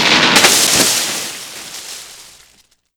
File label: tree fall sound; Slendytubbies V2 Beta